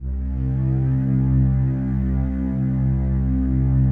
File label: digi pad, Pads